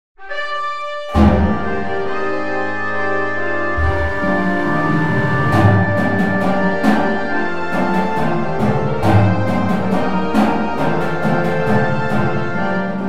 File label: Drums; Espana